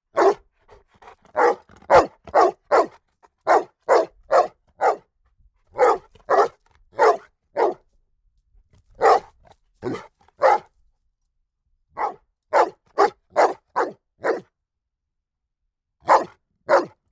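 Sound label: mix Rhodesian ridgeback bullmastiff, dog barking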